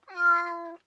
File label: kitty food; minecraft; technic